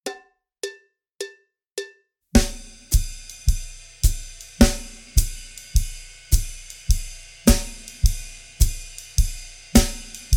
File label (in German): Swing-Phrasierung; Swing-Phrasierung 1; Schwarzes Album; Bum Bum Tschack Jazz Latin